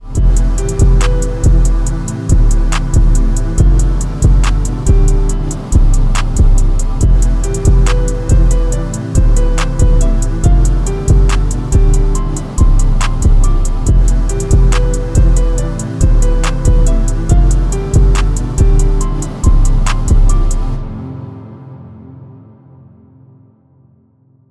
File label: relaxing cool ambient sound